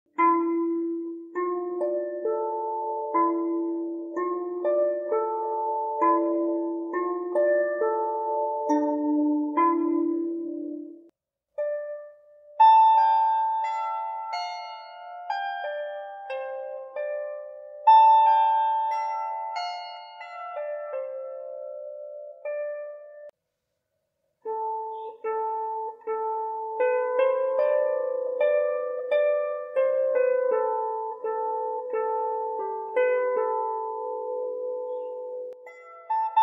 harpika

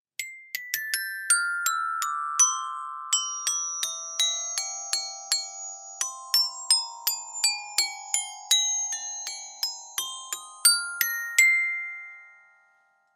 glockenspiel; گلوکن اشپیل

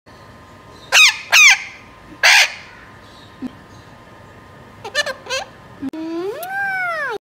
Alexandrine parakeet; شاه طوطی الکساندر

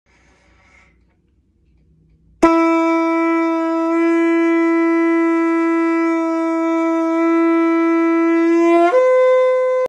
shofar

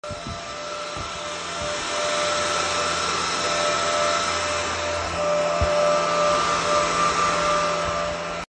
bitcoin miner

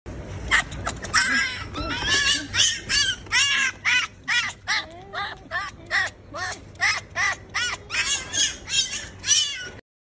Kit fox; وولپس ماکروتیس

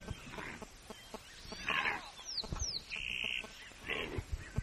Kori bustard

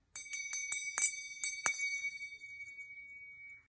finger cymbals

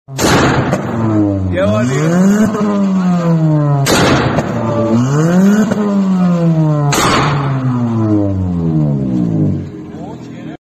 Dena exhaust

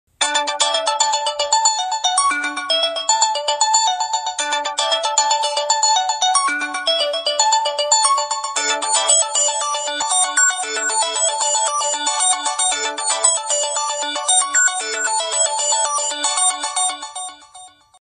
Pantech, زنگ گوشی

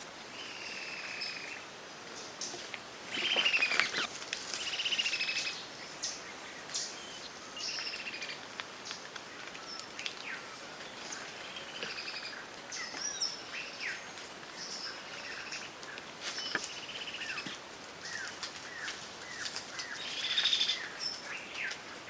red billed scythebill

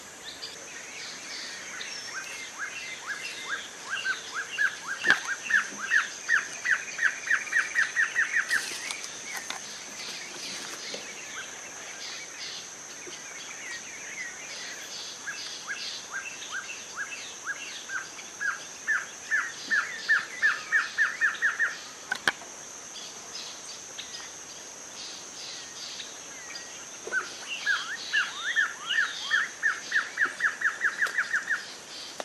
Glossy Antshrike

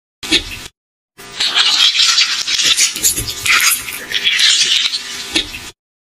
Toothpaste, خمیر دندان

